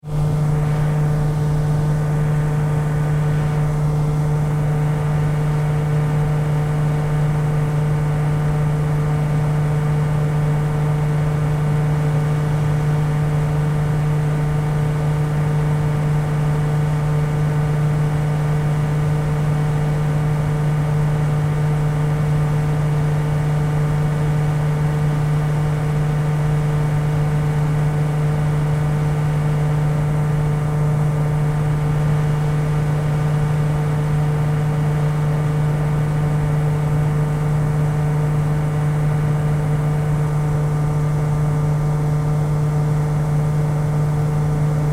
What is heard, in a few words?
Ship Large Ferry Ventilation Shaft Roar; Tom Hutchings